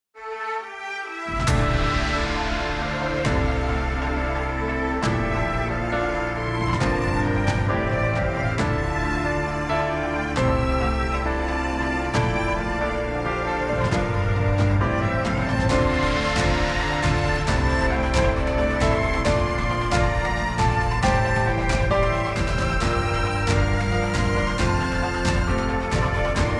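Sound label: Strings Polyphony All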